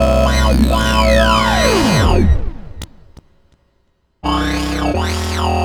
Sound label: Dredgin It Up, Synth Sequences